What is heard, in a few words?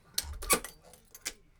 door; 鍵を閉める音